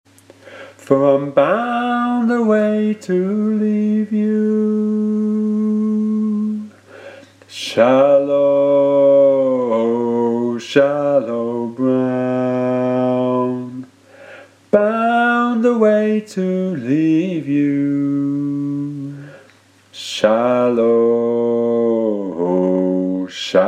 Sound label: bas; Shallow Brown